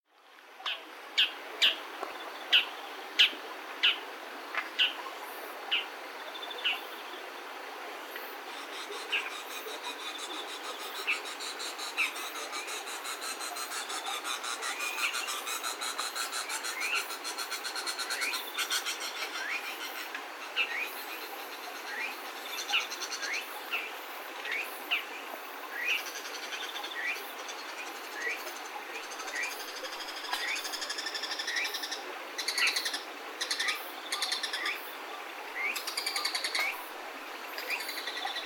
モズ2羽高鳴き; さいたま市; 芝川鉄橋付近

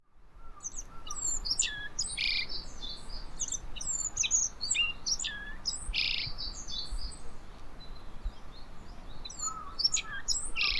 aoji, 春のぐぜり; minumashizenpark

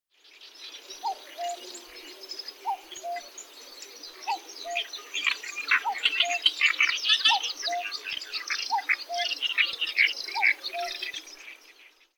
kakkou, watarase

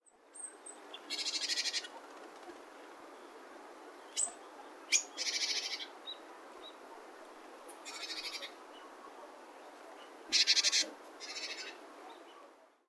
shijyukara; saitamashi

